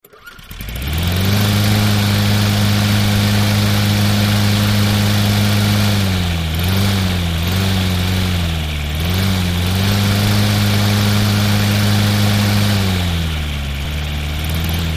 Lawn Mower; Start / Idle / Off 1; Mower Starts, Followed By Fluctuating Motor Speeds, Into A Steady Idle, And Then Turned Off. Medium Perspective. Small Engine.